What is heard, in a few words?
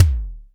BD, Bassdrums, Roland TD-20